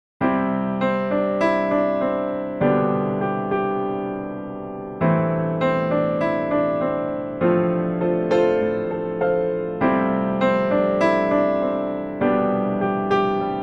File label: Simple Piano Figure in C